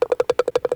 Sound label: Golf Ball Goes In Loop